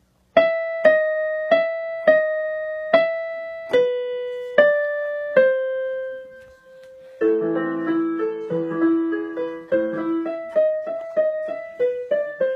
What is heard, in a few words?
Fur Elise on Piano; Beethoven